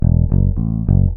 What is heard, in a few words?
Bass Beat